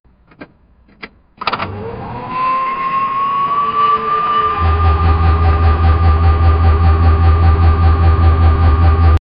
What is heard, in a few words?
effect of, brake